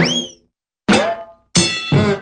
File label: whee boomp ding and honk